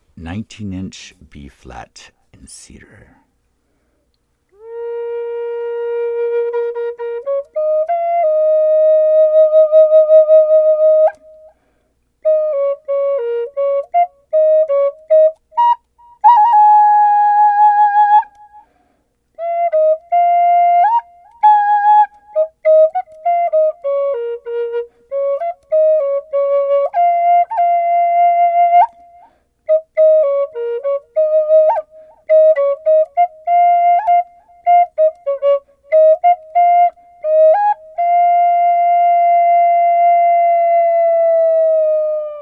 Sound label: 19 inch B flat Cedar; Bb Cedar